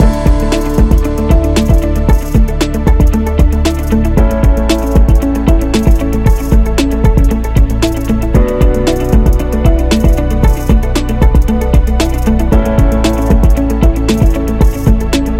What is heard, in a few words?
Real Estate Loop; technology